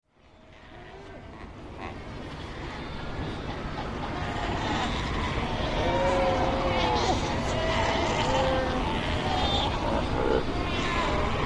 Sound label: cape fur seals